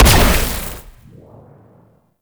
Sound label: Amplifier Chassis Fire; Weapons; Operators Amp Chassis Part A Fire B; Warframe; Amplifiers